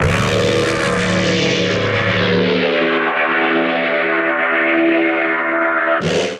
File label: Dark string E Major 150 bpm, Pads; MIDI Clips; Tonal; Skitter and Step; Ableton Factory Packs